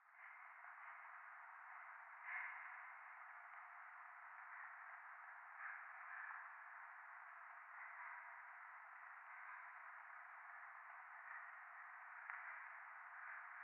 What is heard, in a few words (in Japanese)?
廃墟 左耳快感吐息 雨